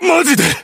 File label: hurt vo japanese; 健次; 传奇